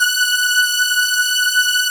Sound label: POLY SYNTH; Wizoo - Powered Wave